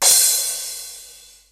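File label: Crash, Boss DR-550; Drums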